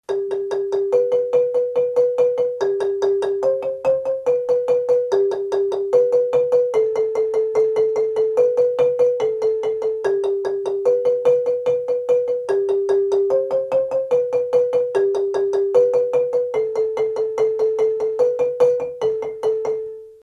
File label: lead low rolling, marimba; kare mugomba